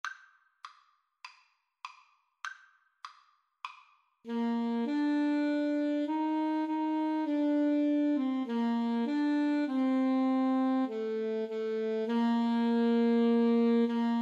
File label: Saxophone Cello Duet